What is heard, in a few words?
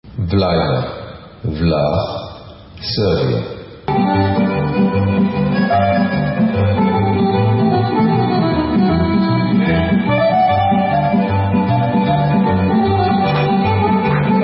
Serbian Instrumental